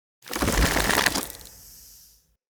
Fey Wild Snare Deactivate; Powers

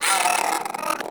Vocal Pain; Hyena Micro

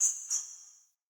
Bird Double Chirp; Paragon Bird

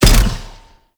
Grenade Launch; Warframe; Nechro Mech; Abilities

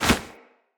Holster Weapon, Foley; Tenno Alt

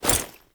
Generic Foley; Warframe; Uriel

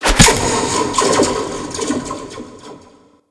Grab Zipline; Gadgets; New Zipline

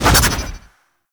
Air Spin, Tonfa; Boltace; Warframe; Tenno

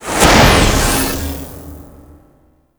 Shield Polarize; Warframe; Mag

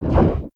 Dash Burst; Warframe; Focus; Operators; Operator Wraith Movement

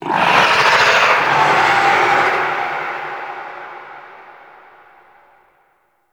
Infested Juggernaut Spawn Warning Vocal; Warframe

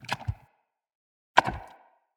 Corrupted Head Teeth Clack; Corrupted Bromius; Wrath Head

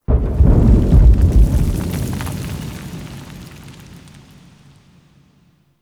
Dist Explosion Debris; Ambience; Warframe; Nokko Colony

